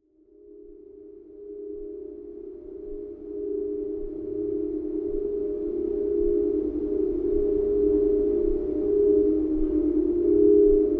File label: Church with rain